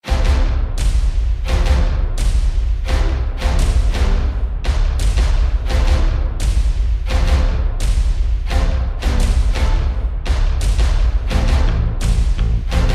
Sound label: Power Sweep, bgMusic